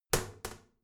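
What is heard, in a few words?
toilet lid wall open heavy, bathroom; haus-garten; badezimmer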